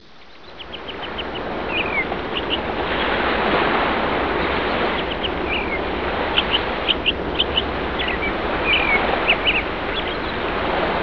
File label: Bird; 特酷声音素材库